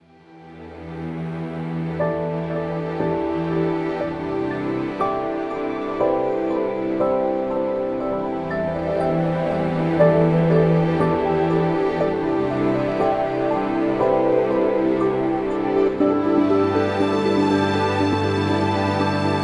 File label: Significant Ambient